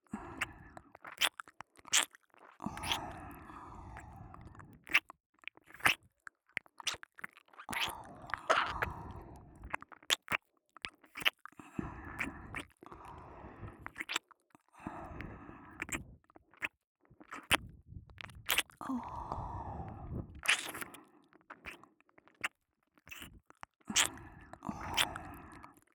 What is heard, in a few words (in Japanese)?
耳キスチャームループ; キルシュ